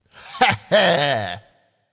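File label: "heh heh", nonverbal; smite; voicepack; khepri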